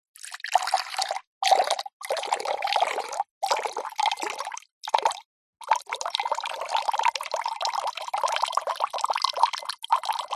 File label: food drink water pour into glass slowly